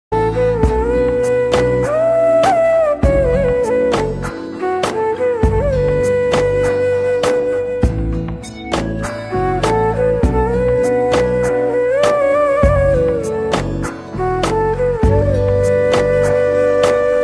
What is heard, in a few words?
bgm ringtone instrumental